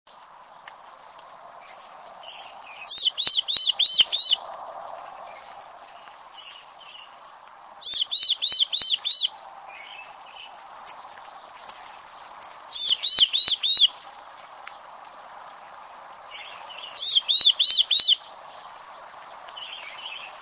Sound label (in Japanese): hashibutogara, birds